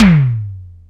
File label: Jomox Xbase888; Drum Machines